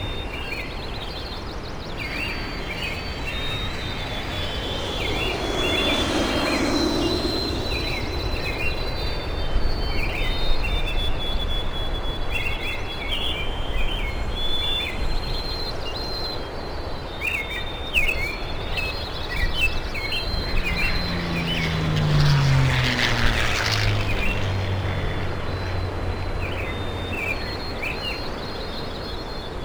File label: in the sky, ambiances